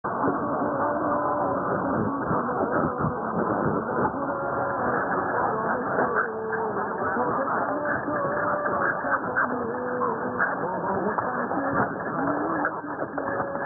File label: Radio w 3 note ascending chimes; 1188 Radio Payam Iran